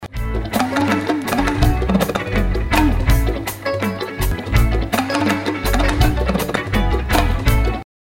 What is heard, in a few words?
talking drum